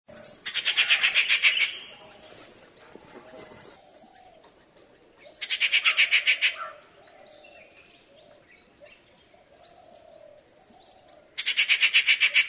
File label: rufous treepie